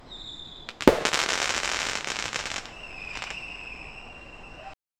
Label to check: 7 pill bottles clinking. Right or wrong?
Right